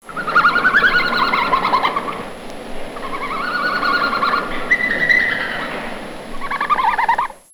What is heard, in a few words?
Red panda twittering; haeck